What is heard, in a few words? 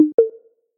new message